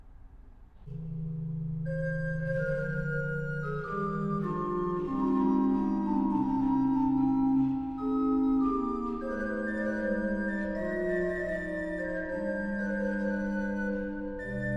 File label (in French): orgue; Livre d orgue II, Ton 5 IV. Quatuor